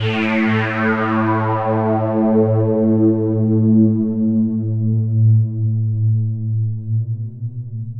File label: Sustained Pad, EDM; Sonic Foundry (Sony Creative Software); Afterhours EDM; Retro Active